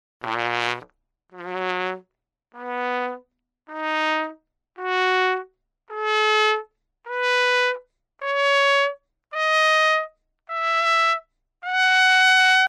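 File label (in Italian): trumpet NAT Armonici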